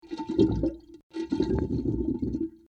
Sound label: gurgle, Water Gurgle; Underwater Perspective; Nature